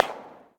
bump; BlockHead; World Of Goo

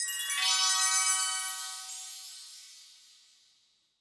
medal get platin; CrossCode

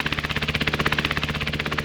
hedgehog propeller; battle

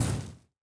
fire hit light; CrossCode; battle

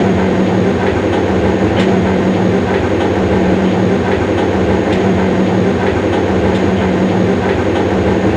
lift move; The Swapper

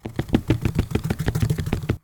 run; Chapter3Mid

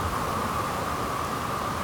suck loop; World Of Goo

